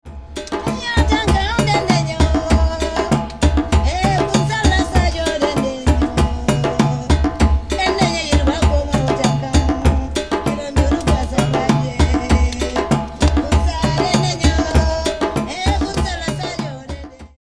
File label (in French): afrique madingue